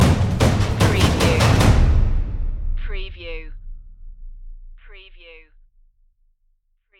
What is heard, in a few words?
drums of destruction stinger